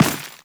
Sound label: Run Dirt, Footsteps; Warframe; Teshin